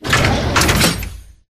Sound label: med bay rack offload; Titanfall 2